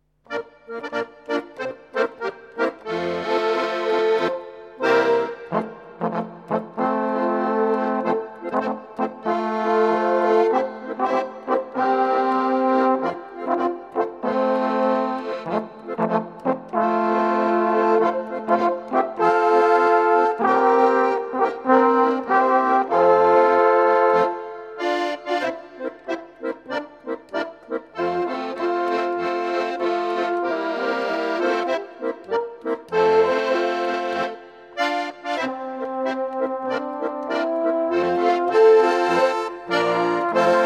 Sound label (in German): Heiner Polka